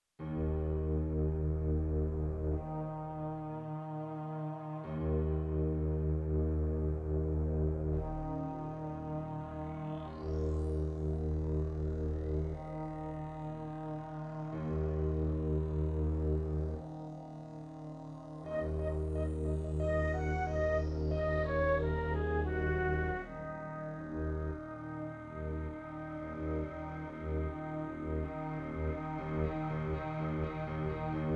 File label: Prelude; The Message